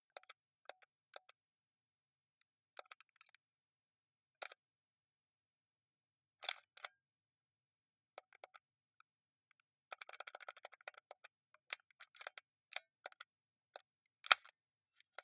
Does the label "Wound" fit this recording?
No